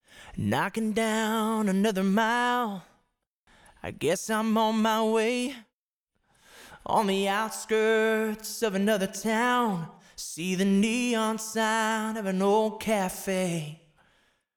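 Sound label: Vocal Small Room; Reverb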